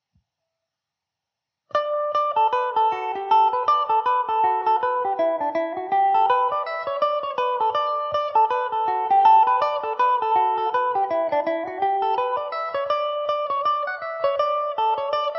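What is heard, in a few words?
D major mandobird, irish mandolin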